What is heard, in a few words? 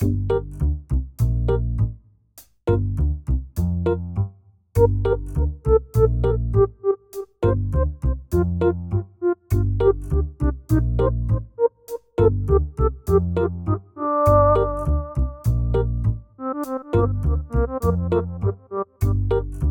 happy